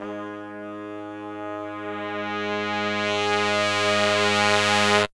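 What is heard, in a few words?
brass crescendo; Zero-G; Phantom Horns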